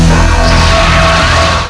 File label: kamehameha